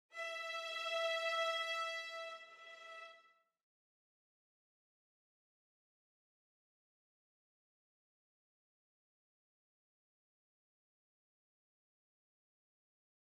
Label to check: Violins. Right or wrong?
Right